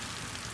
amb rain light

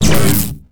plasma deflector pick up; Pulse Racer

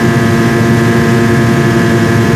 rpm, sled; WhiteOut

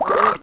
gurp; player

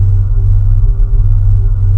drone loop, ships engine; Quantum Redshift; XBOX

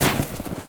dirt hit

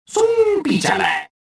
escaping

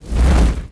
flaming Axe swing; weapons

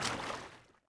water wade shallow

